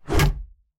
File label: 2x4 Floor; Scrap Weapons; Zomboid